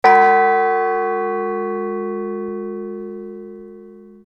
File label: meneely bell